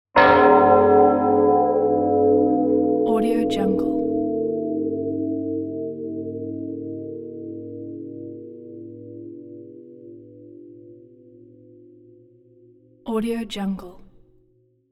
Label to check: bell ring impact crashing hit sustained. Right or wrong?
Right